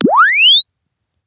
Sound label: Press Two Sides Chirp; Samsung 4 Recordings